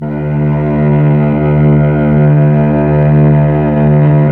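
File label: STRINGS Mellotron Cello D, Mellotron Slow Combo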